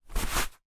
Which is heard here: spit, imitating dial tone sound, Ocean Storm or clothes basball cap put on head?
clothes basball cap put on head